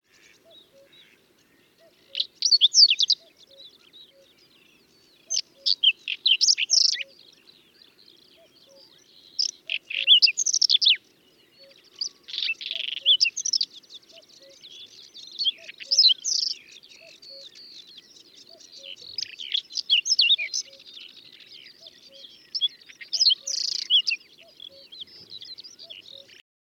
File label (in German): Braunkehlchen